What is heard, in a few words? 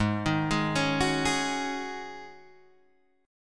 G sharp 7th Suspended 4th G sharp, Guitar DADGAD